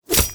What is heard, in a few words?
apple Hit; Interactive games; Grammar Games; coordinating conjunctions